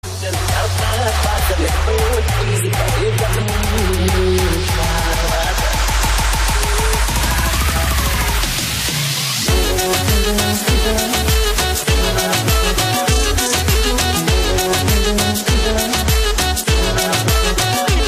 remix bollywood ringtone

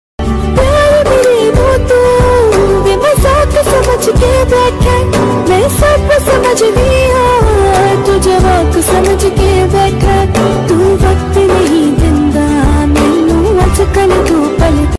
sad ringtone

